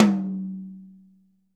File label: HITOM, drum